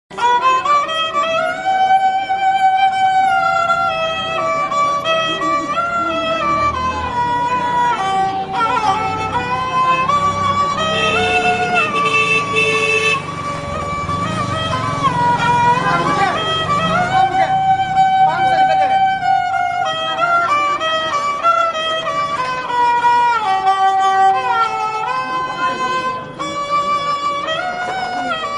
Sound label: Instrumental Ringtone